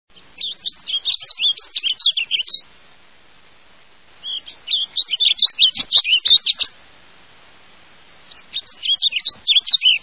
Warbling Vireo

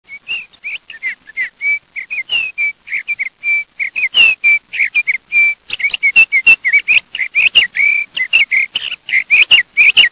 Sage Thrasher

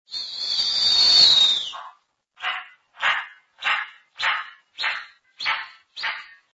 Snowy Owl, songs

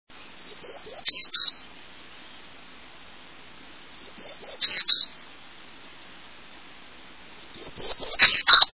Townsends Warbler, songs

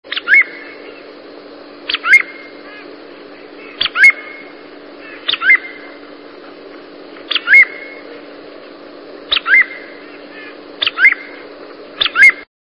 Groove billed Ani, songs

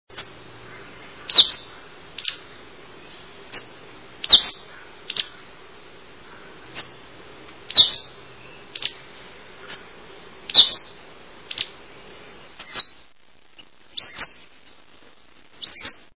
Pacific slope Flycatcher, songs

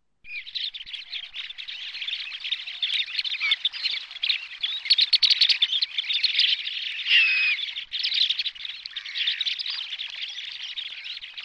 Little Stint

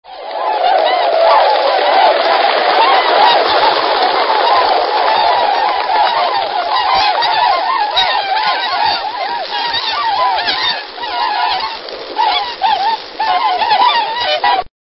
Tundra Swan

